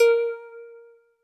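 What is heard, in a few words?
Jazz Harp Kawaii A#4; keys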